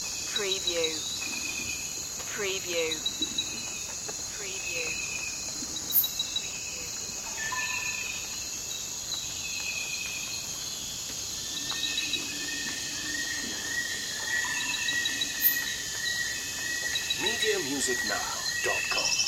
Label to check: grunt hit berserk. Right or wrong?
Wrong